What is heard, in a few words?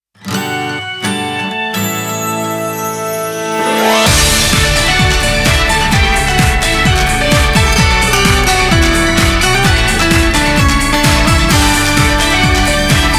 off vocal